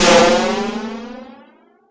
CYBER KIT, DRUMS

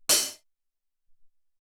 Mid Hat, Alesis SR-18

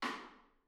R B Rim Room, drums, One Shots; Sonic Foundry; Drums from the Big Room The Mixes

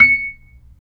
B-3, percussion; Keyboards of The 60's and 70's

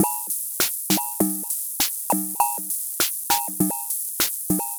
Robo Beat 100BPM, sci-fi Beats

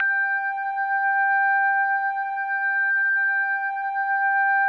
P5 ORG G4, PROPHET 5; Greytsounds VOL-1

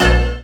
MISC, Skanky Perc

